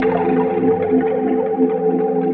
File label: wave pool, Roland JV80; Rubber Chicken Software Sample Bank